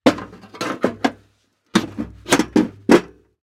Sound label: crush rattle metal scrap debris; sonniss gdc